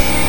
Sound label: NOIZE, Synth FX Misc